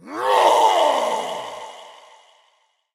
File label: shout; smite; odin